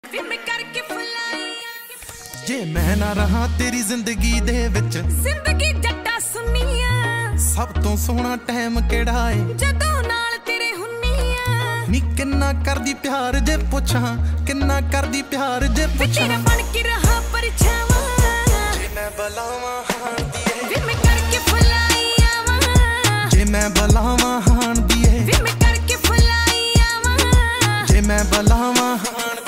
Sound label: Ringtone